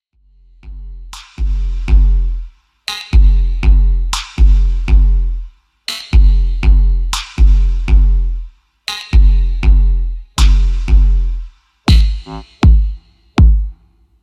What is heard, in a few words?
Distant Drums